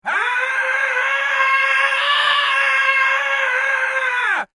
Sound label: Horror Scream Processed Effect Male; Screaming; Voice